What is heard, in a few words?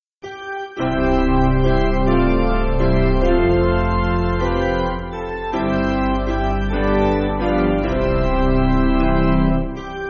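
Piano, Music Backing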